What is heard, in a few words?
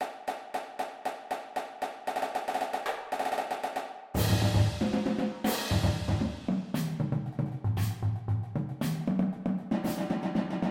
full drumline